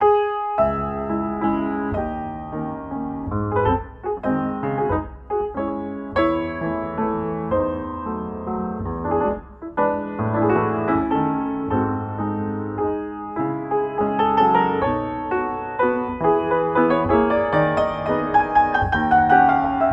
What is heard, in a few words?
Waltz in C minor